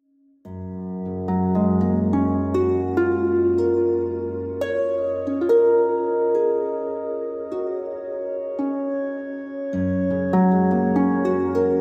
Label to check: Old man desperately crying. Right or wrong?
Wrong